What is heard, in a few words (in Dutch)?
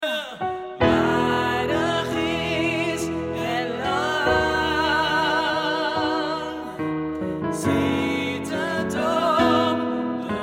Waardig is het Lamb Ten, vocale partijen choir; Presence op het Malieveld